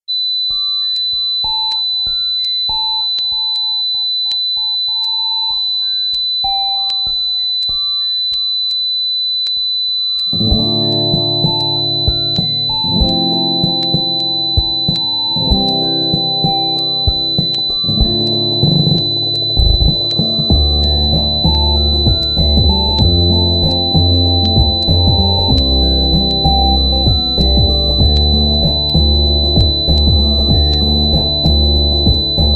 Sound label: sad robot lowpass tinnitus; hackandhear